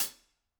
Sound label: room Cl Hat, drum